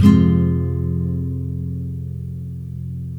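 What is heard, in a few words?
NYLON, F MINOR DOWN, CHORDS; Spectrasonics; Hans Zimmer Guitars VOL-1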